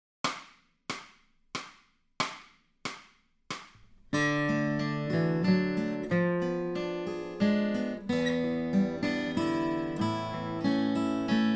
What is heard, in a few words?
Accompaniment, acoustic guitar; Grade 7